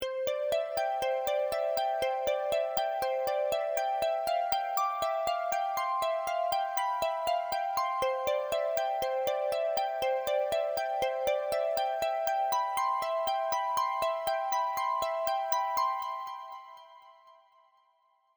Sculpture Glass

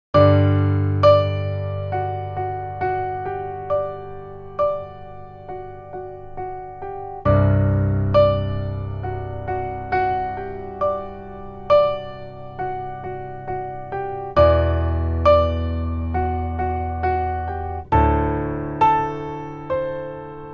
PIANO Thick Piano Room

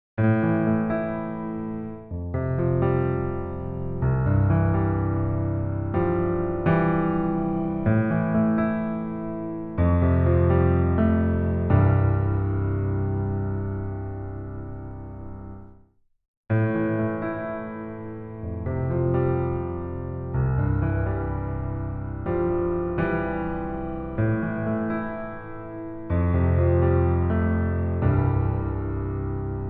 PIANO Big Bright Room

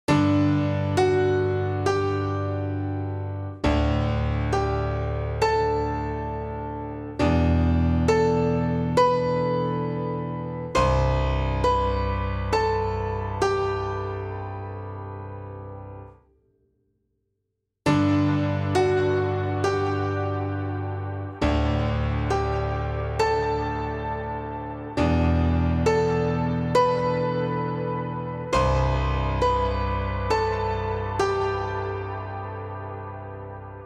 Piano Ballad

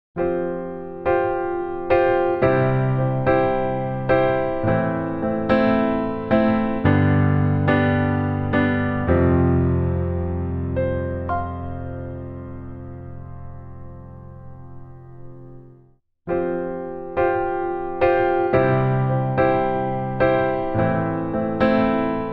PIANO Grand Ambience

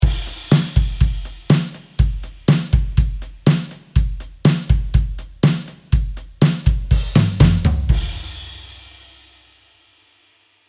Vintage Drums

